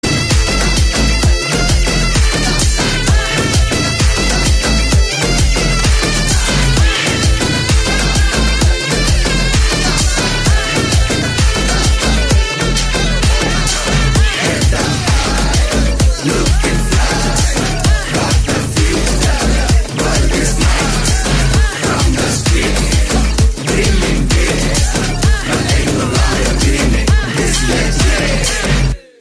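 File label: background music Ringtone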